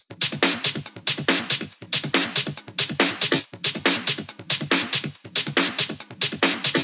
drum Loop 140BPM; Multi Loops

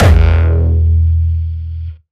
Jump kick, Jump hardstyle kick

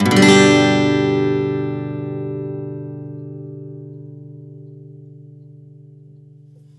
G downstroke, Acoustic Guitar; Sonic Foundry (Sony Creative Software); Songwriter Acoustic Guitar Companion; One Shot Chords

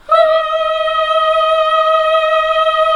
HMM D#3, classic vox

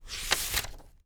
TURN PAGE, READING BOOK; AKAI S-Series CD-ROM Sound Library VOL-6

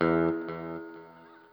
NOODLE, Guitar & Bass; Quantum Leap; 90's Sample CDs

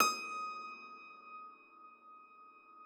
piano D4, Thumb Tack Piano ff +ped; Total Piano

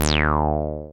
SYN 303, Roland TB-303

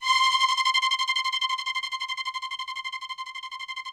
ROUGH STRNGS, Yamaha VP-1; Music Store

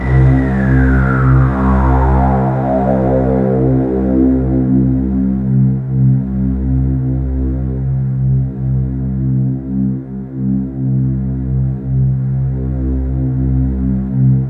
ETHERAL-S, KURZWEIL K2000

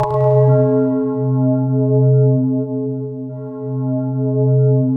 SPACBEL, SynthLine; 90 s Sample CDs; NiceBeats; SynthLine VOL-2

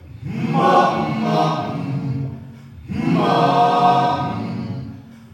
HUMA HUMM, CHOIRS; Spectrasonics; Heart of Africa

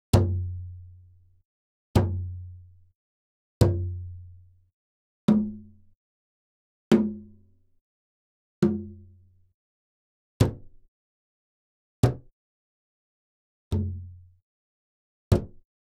Gamelan; Propeller Island - Complete Gamelan

